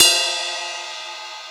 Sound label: Ride, Akai MPC-60; Drum Samples